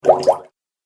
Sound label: Goop Sound, cartoon